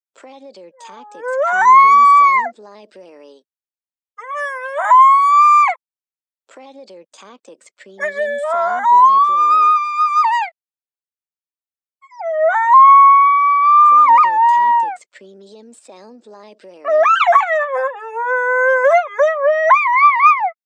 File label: Lonely Young Female